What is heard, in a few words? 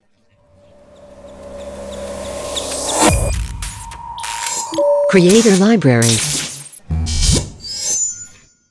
Modern Electronic Logo; Logo-Idents